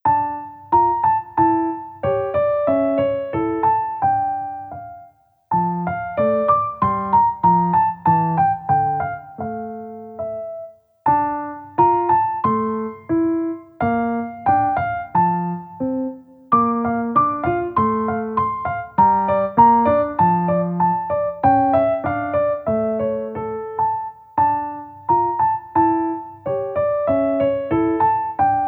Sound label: Sonatina in F Major Rondo, piano; beethoven